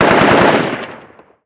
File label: gun ak47 med; modern warfare 2; amb elements